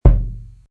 NOUVELLE CLIC; MOBICLIC145

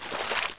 CHAT; netspade

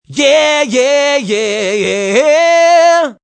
chant; MOBICLIC 83; CPLUSCLAIR

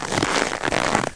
neige; Mobiclic

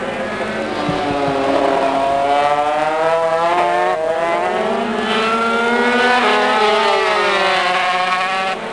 moto

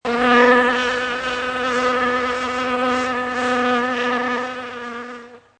abeille; MOBICLIC 125

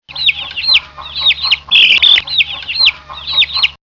Cri; MOBICLIC 65

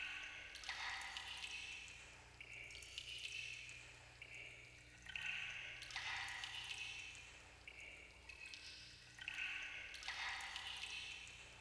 eau

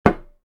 bouteille

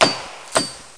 bruit

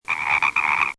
grenouille; MOBICLIC 65

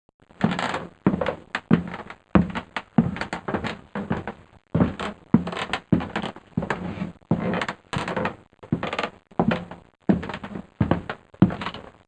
PAS

